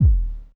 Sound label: Kick B Hit, Bmin; Hip Hop; Hip Hop Exotica; Apple Loops; Big Fish Audio